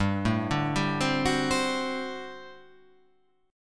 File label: G Major 7th Suspended 2nd Suspended 4th G down